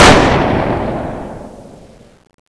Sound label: gun shoot; CSO Cronowing; cronobotics54